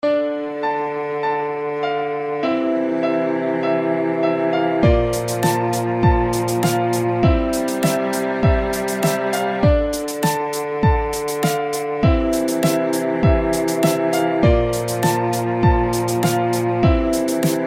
instrumental

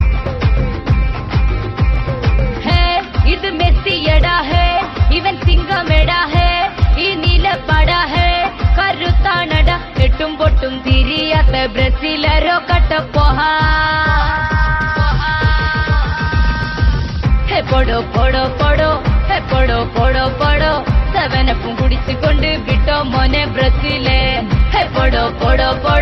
argentinian song

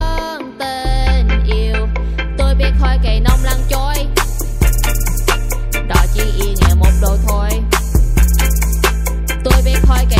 vietnamese ringtone

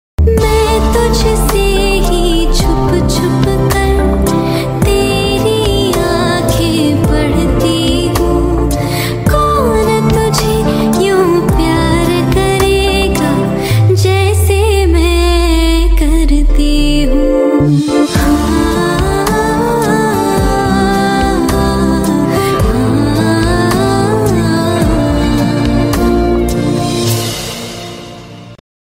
unplugged cover; msdhoni

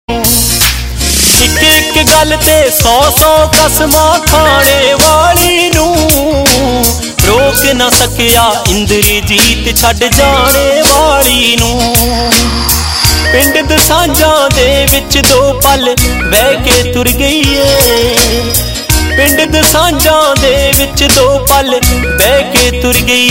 punjabi ringtone

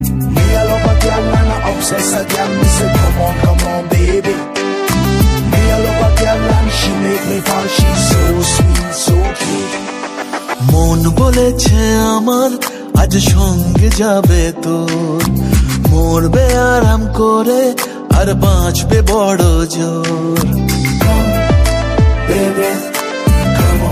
new bengali ringtone

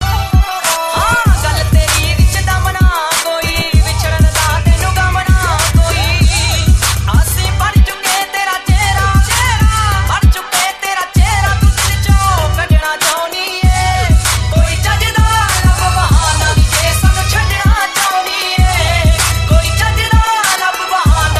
punjabi ringtone

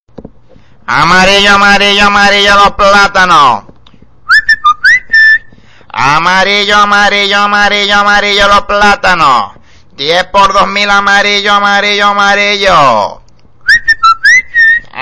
funny latin ringtone

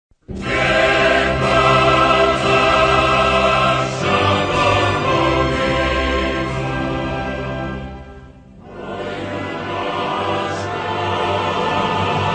croatia national anthem

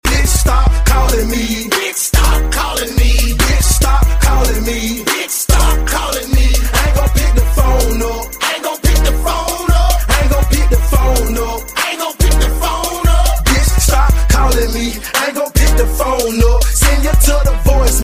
ringtone

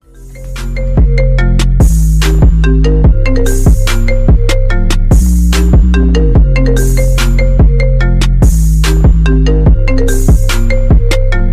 apple iphone ultra base music ringtone

